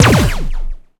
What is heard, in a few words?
sci fi weapon gun shot; BluezoneCorp